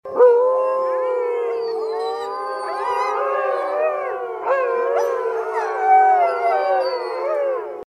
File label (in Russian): s shhenkami